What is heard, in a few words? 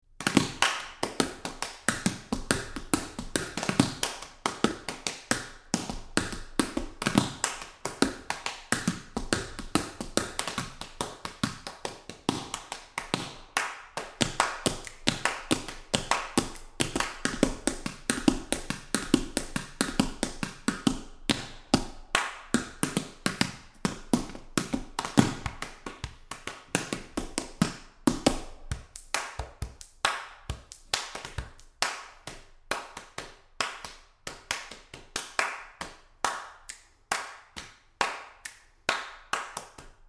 bodyclapping